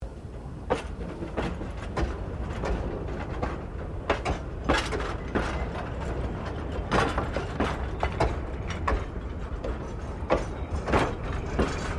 Train Diesel Shunter VA1 Class 1947 Tasmanian Shunting; Bellarine Tourist railway vehicles